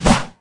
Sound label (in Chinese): Bear atk, 熊灵布鲁斯攻击音效; 妮塔; 荒野乱斗; 熊灵会用爪子攻击对手